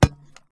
fighting game source foley destruction sweetener organic; dull hit